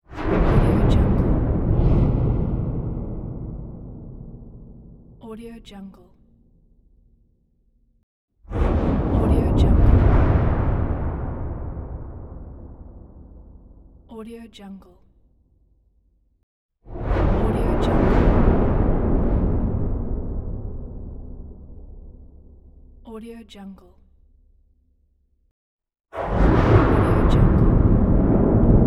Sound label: bass whoosh